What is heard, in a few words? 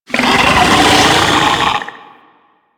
Infested Ancient Idle, Enemies; Warframe